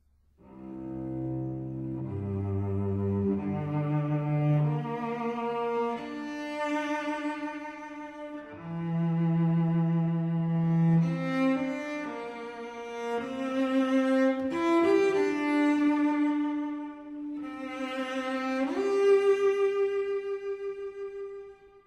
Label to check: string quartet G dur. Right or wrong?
Right